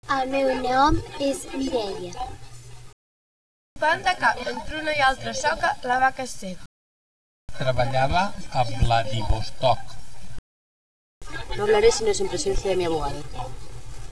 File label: Four Voices rec